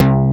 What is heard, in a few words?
FMPCM, SYN BS; AKAI S6000 CD-ROM - Volume 1; SAMPLE WAVE MIXING 1